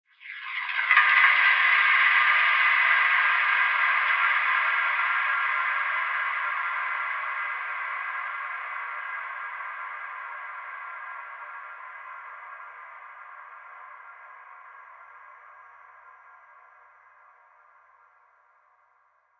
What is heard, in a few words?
SWELL CLICKS, Swell Clicks Reverb Filter; Production Element Imaging Element Accent Transition